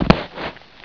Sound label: BODY